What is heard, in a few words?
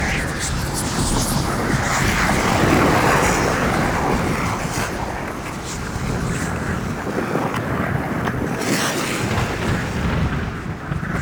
Void Storm Loop; Railjack